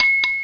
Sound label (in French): Cloches Double Carillon